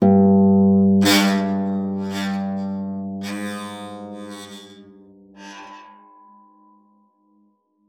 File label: PEDX F#2, harp; Xsample VOL-8; HARP+FX